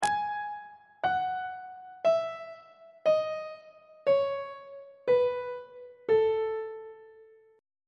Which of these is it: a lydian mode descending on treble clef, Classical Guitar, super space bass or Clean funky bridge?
a lydian mode descending on treble clef